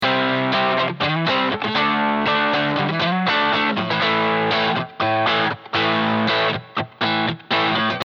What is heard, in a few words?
Strat Crunchy